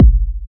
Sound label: kick single shot f key, Loudest frequency: 143Hz